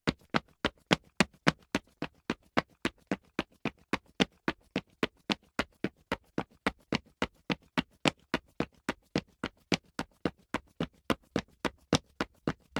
Footsteps; LOOPERS; Tyler

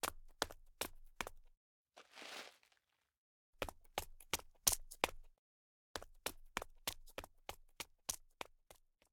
Run; LOOPERS

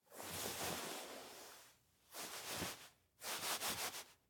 Shake; LOOPERS

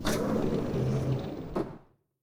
Open A Door Elevator; LOOPERS

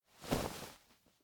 Rustling Of Clothes; LOOPERS

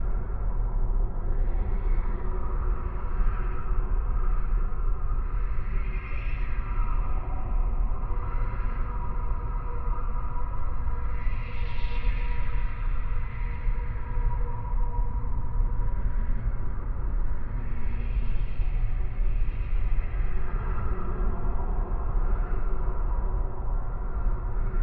Wind; LOOPERS

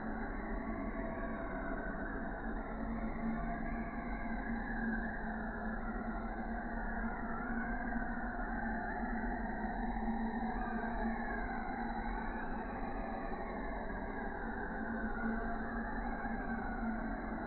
Cold Wind; LOOPERS

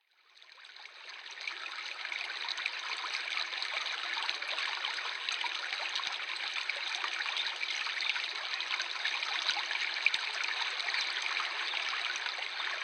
Babbling Brook; LOOPERS

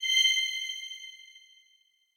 An Enchanting Light; LOOPERS

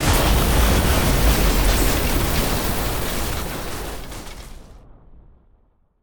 Collapse; LOOPERS

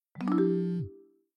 Receive A Message; LOOPERS

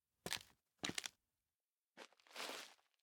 Look Back; LOOPERS

